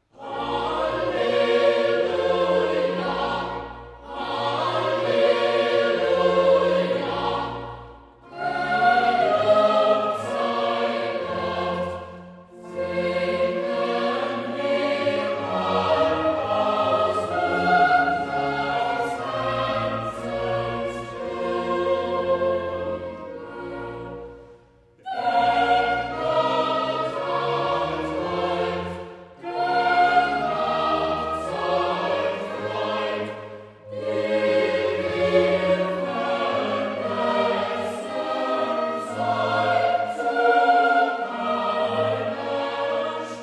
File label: Choral Coro; Unser Mund sei voll Lachens; BWV 110; Alleluja; Gelobt sei Gott